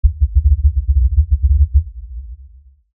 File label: Lfe Pulse Low Bass; Drone; Sound Design